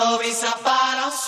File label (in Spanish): COROS; multitracks